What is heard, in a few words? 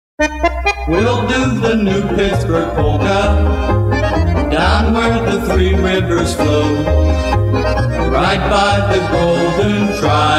New Pittsburgh Polka, accordions